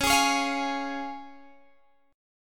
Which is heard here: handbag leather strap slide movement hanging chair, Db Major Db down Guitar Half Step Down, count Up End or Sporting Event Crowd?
Db Major Db down Guitar Half Step Down